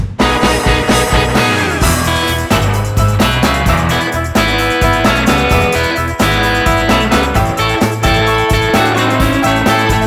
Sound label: Scarecrow Scare Instrumental